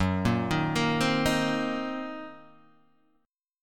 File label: Gb 6th Flat 5th Gb down Guitar DADGAD